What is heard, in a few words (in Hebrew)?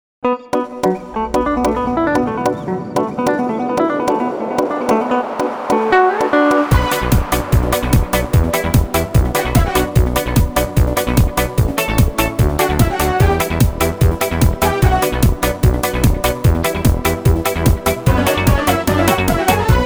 גינגל דוגמית